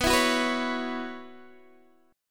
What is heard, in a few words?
B Suspended 2nd Suspended 4th, Guitar, DADGAD